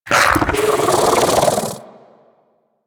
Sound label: bug vocal mount; Warframe; Hunting Critters; Inf K Drive Bug